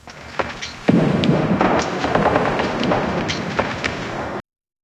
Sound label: distant sporadic gunfire, bird chirps